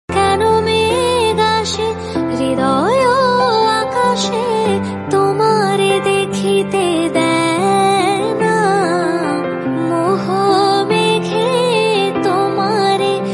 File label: Bengali Ringtone